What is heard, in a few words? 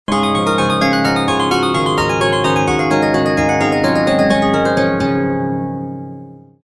Death Theme; Swords and Sandals Medieval